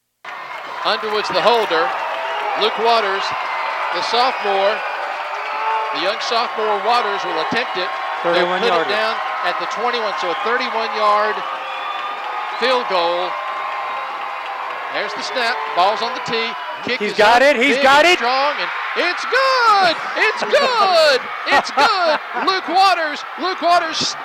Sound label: kick